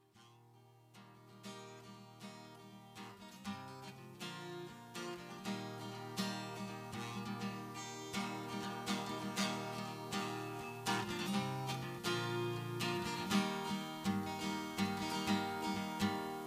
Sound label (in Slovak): Chvaly